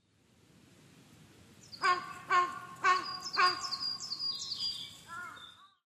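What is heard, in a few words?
large-billed crow; hasibutogarasu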